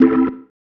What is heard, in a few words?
Stab, Organ, One Shots